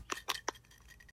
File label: paper shake